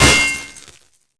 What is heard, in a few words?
sentry bombball hit world